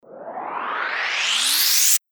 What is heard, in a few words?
RISER